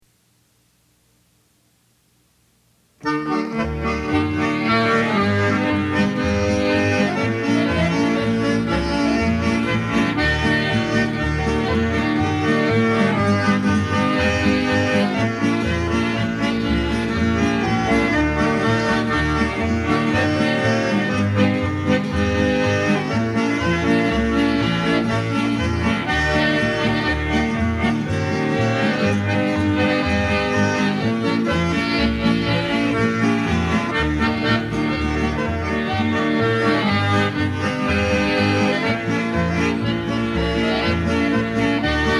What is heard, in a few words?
Polka